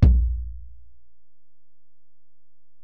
Ac BD, Alesis DM-PRO; 300 Drum Machines

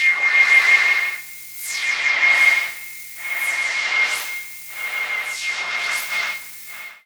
Filtered Noise, radio signals

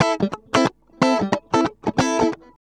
ACID JAZZ

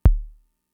Kick, Drum Machines Fricke MFB-522

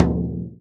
BASS, INDIAN PERC; Big Fish Audio - Didgeridoo and Other Primitive Instruments

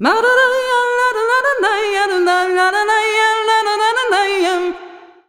THA VOICE L; USB Soundscan vol.63; AKAI

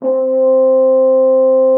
FR HORN C4; Xsample VOL-3

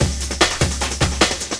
LOOP, Drum & Bass, 150 BPM

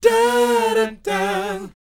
BOYZ E, Vocal Planet R&B & Dance; ILIO

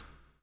BLUES TK, Backbeat; Spectrasonics - Backbeat

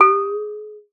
water; Xsample VOL-9

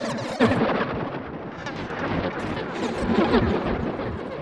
Alien Chatter, sci-fi

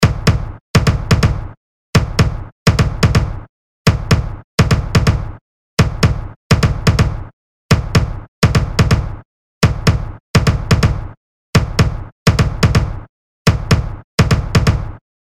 Kick Door; Industrial

